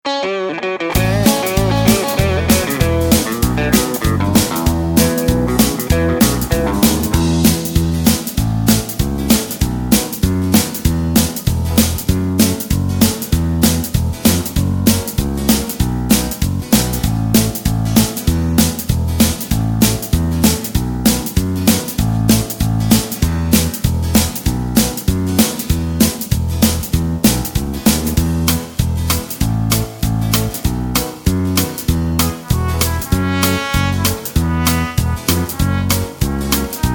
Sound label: (E To F) No Backing Vocals